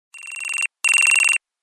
Drill Beep; KE500; LG

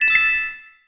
camcorder end musical, camera sounds; Samsung SGH-i740

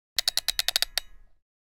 Message, Nokia Alerts; Windows Phone apps

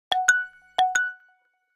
Email, Nokia Alerts; Windows Phone apps

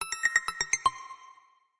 Synth, notifications; ZTE V811

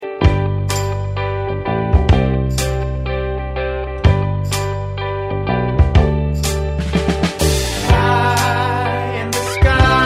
Melody, Ring; Doro Liberto 820 Mini

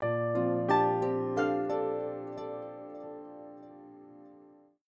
Power off alt; Samsung SGH-F275L

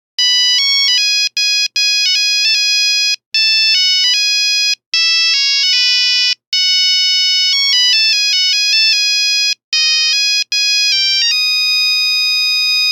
Toreador, Nokia Buzzer; Windows Phone apps

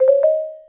camstart; LG

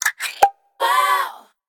pop, notification; Lucid 4G VS840; LG

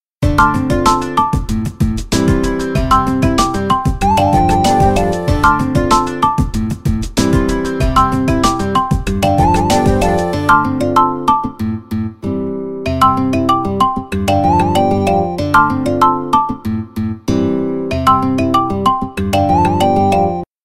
Jumping Bean, ringtones

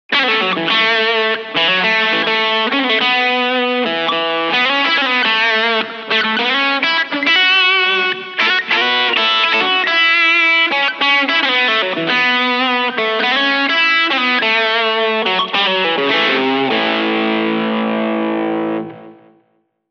Rock The New Year, ringtones; Microsoft

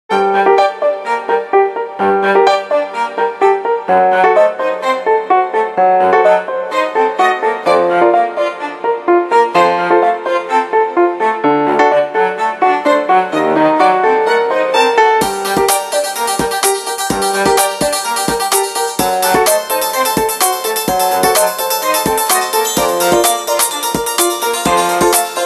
Ring Timeline; HTC Touch

